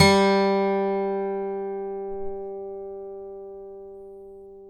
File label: ACGT GOP, ACCGTR PICK